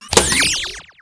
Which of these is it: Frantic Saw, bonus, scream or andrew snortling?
bonus